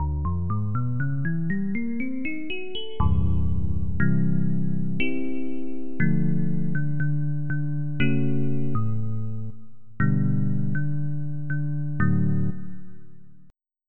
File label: EP Combo, Soundfont; Onj's Soundfont